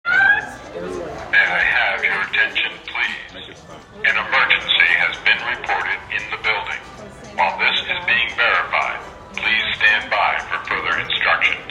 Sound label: lounge emergency